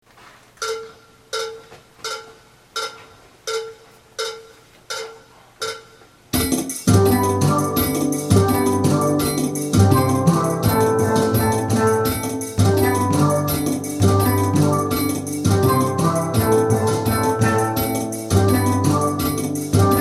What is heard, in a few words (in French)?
TUTTI PONT